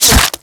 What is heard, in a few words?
Bullet Flesh; Warframe